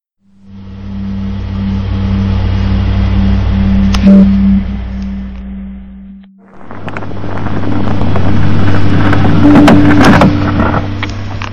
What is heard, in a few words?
needle rocking